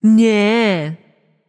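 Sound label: tongue, female voice; smite; voicepack; hera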